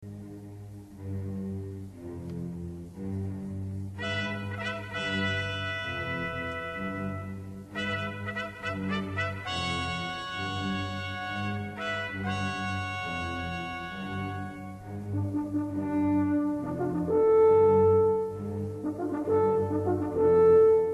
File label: basso ostinato; honegger cortege roi david